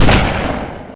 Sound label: Snip Short; GTA - Long Night